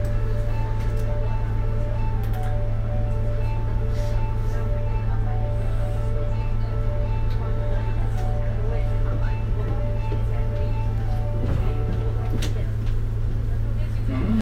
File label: bus melody